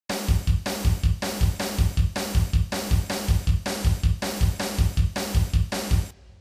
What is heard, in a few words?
Drum; MidiEdit; studiobp